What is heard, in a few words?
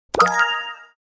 Button positive notification